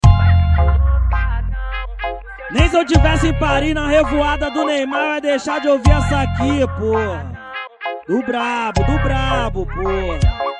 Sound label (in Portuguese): brega funk